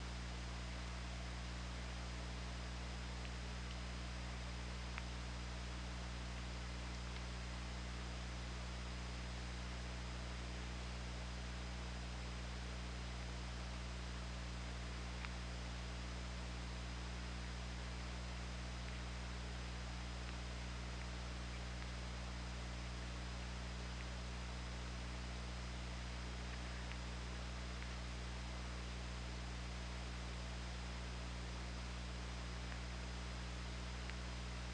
OrcaLab

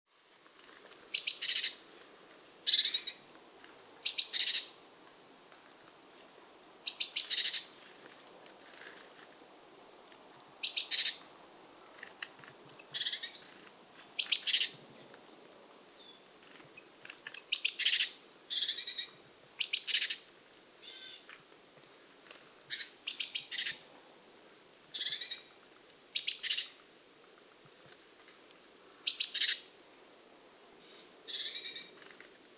Cyanistes caeruleus; SETE

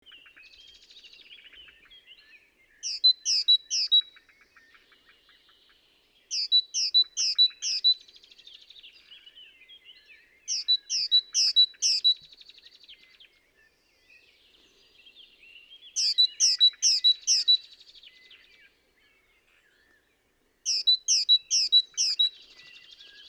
Parus major; SETE

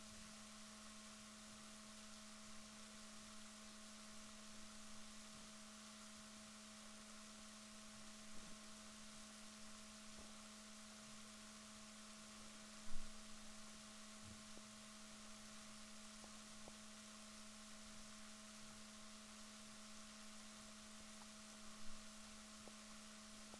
rorquals negatif, Balaenoptera Physalus Chile

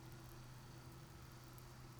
pulses, BALAENOPTERA; MONACO 2022-07

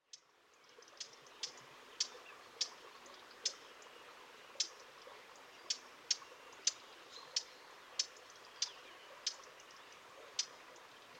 Sylvia atricapilla; SETE

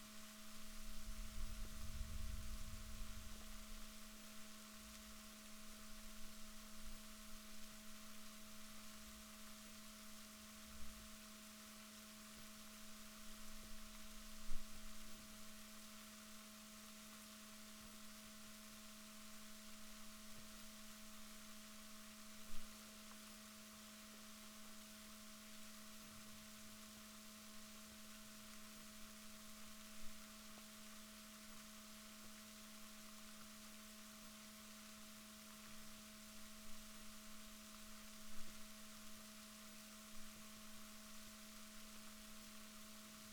rorquals positif, Balaenoptera Physalus Chile

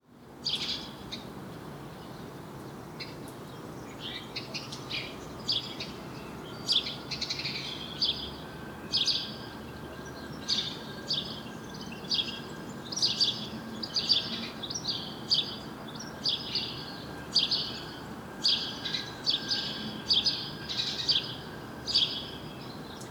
Passer domesticus; SETE

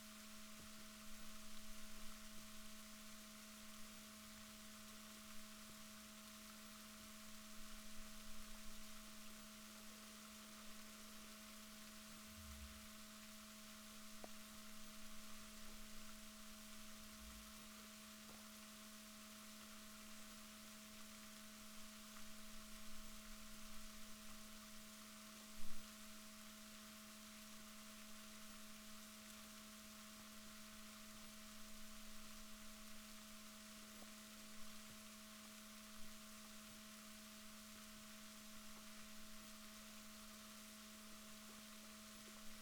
rorquals positif, Balaenoptera Physalus Chile

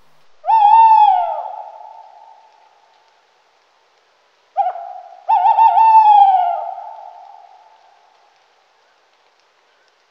strix aluco, bird

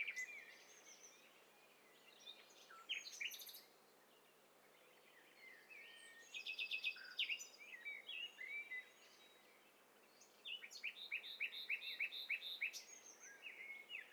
STEREO BIRD COCKTAIL PARTY

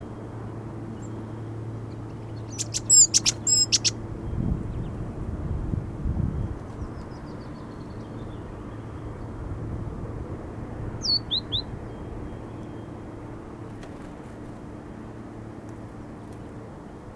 Parus major; SETE

